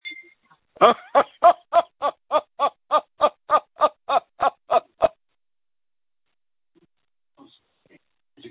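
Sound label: screams that feature laughter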